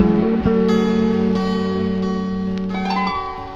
guitar, Jazzmatazz loops

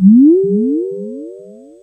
wobbly; Zaps and Beeps

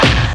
king kut, Snares Knocks

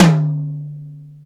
Toms

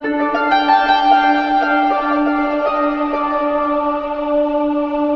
piano, Loops Sad

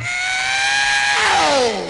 ow

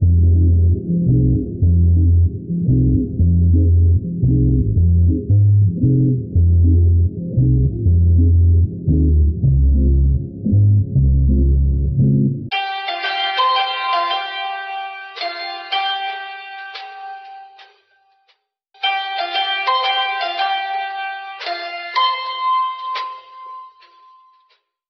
keys, Phonky loops

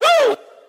woo

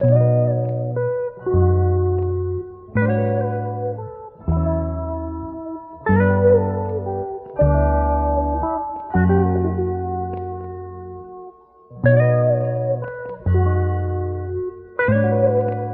guitar, Phonky Loops

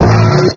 stab

